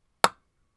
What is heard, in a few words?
nail; tea time; tea time linear